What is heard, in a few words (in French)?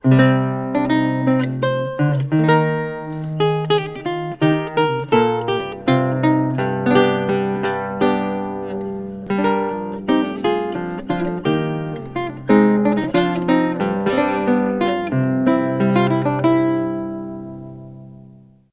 Luth oriental et Renaissance